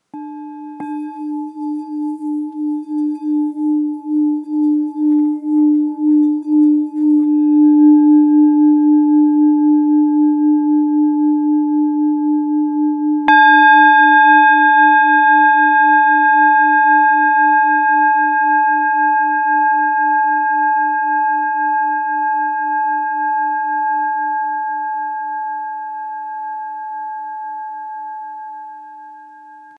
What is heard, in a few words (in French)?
Bengale 500-600g re